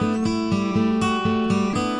acoustic guitar picking

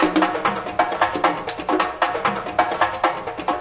tribal clacker, drum loops and breaks

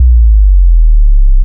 junga sub down, Jungle Bass

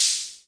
rhma hh, Whippany Rhythm Master; drum machines; 1970s

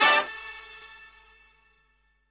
brass a7th hit; Horns and Brass

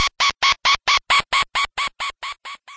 laugh, VIDEO GAMES; sound effects and noises; panic

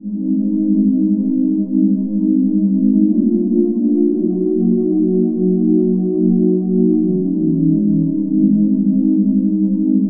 late night soft pads, synth and electronic loops

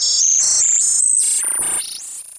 six eight synth, synth and electronic loops

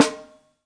real snare; REAL LIVE KITS; A Real Freakin Drum Kit

